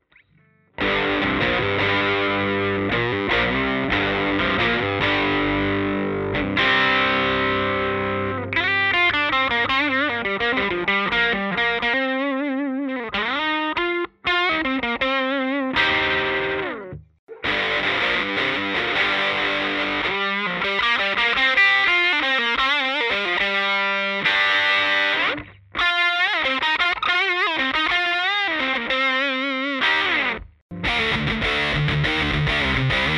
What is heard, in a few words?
Sansamp Double Drive